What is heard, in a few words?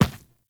Medium Run Default, Footsteps; Warframe; Infested Footsteps Medium